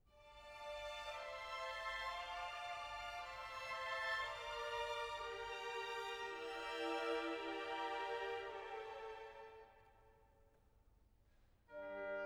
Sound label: Concerto for Piano and Orchestra; Mussorgsky; Pictures at an Exhibition; L Leonard; Geoffrey Simon